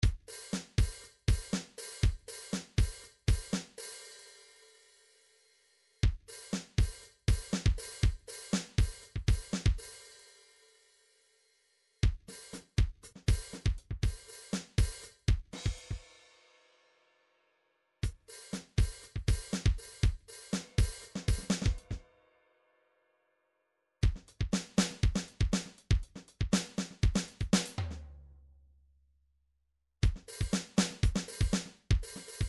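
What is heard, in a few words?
drums 808 pattern, midi; Paul Kodish Dangerous Drums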